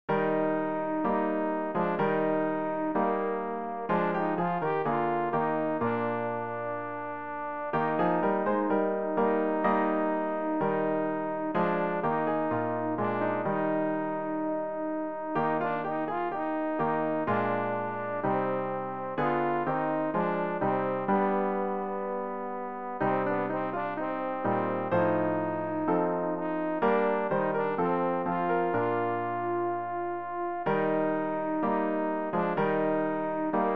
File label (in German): alt, Übehilfen für das Erlernen von Liedern; tochter zion freue dich